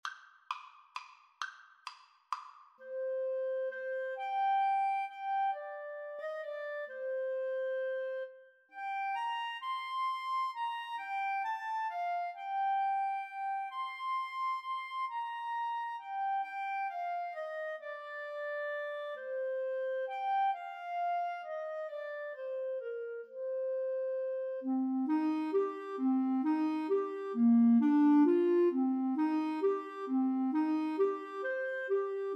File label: clarinet saxophone duet